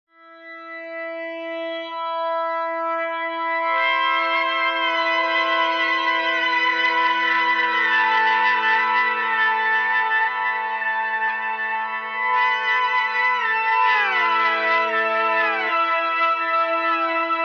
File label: POG and 16 second multi octave slide